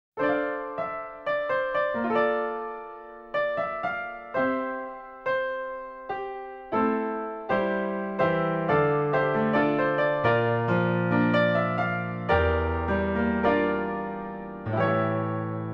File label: Piano Solo version; Over The Top; Rockman Sound Box 2